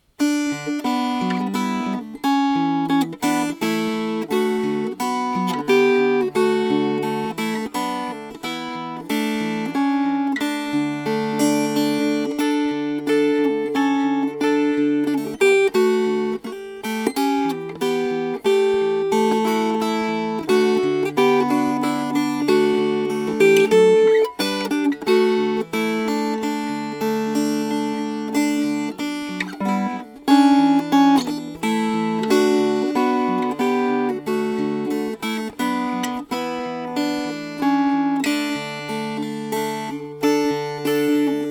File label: Fingerpicked; My Country Tis Of Thee